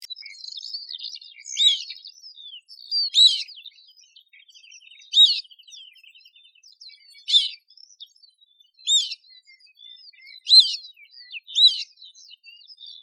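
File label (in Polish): czajka głos pisklęcia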